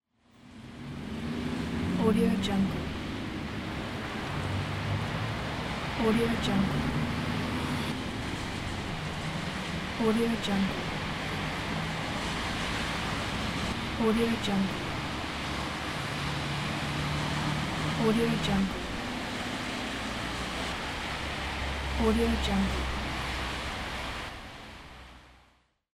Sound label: city ambience